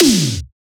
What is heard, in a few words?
DRUMS 80s kit Tom; Tom Arnold